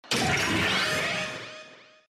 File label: Drive Shift